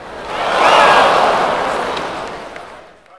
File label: ohh, crowd; MX Unleashed